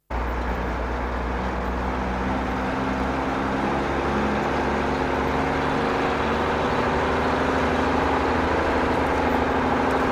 LKW innen, Verkehr

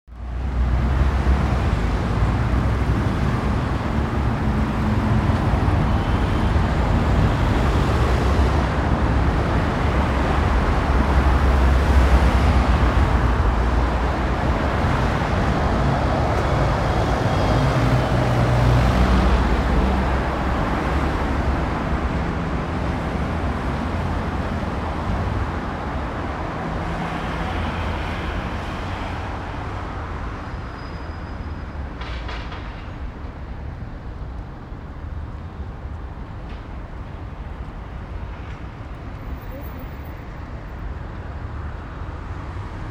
Strassen, Verkehr

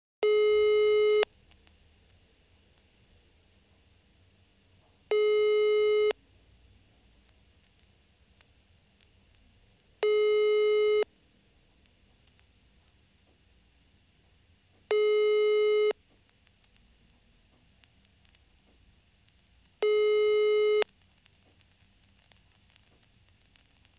Anrufzeichen; Haushalt

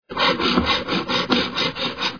Saegen; Industrie

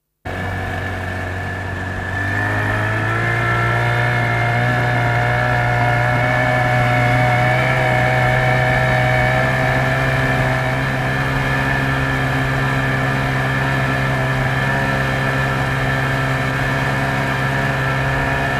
Motorboot; Sport

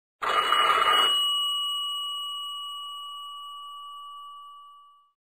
Telefon altmodisch; Haushalt

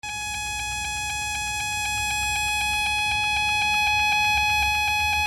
Wecker modern wird lauter; Haushalt

